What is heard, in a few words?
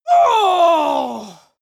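female combat hit hard, screams; schreie; menschen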